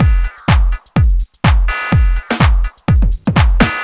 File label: Techie Bell, Drum Loops, 125 BPM; Sonic Foundry (Sony Creative Software); Underground UK House & Electro